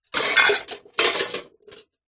impacts metal cooking pots hit drop crash

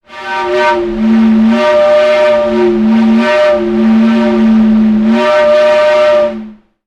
sound design effect air pipe pressure

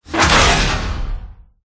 Sci-Fi Explosion; Ryan Van Eerde

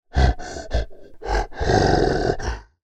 animals gorilla grunt close to microphone

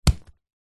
rock small hard impact on soil thud; foley; zapsplat

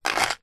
foley metal key chain small set down card surface; zapsplat

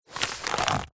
magazine cheap pick up; household

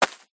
footstep single ground farm hay dirt; foley; zapsplat

